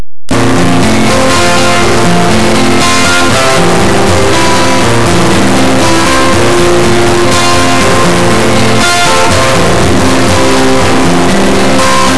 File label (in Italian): musica newage